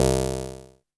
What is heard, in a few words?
ELKA, String Machines; ELKA RHAP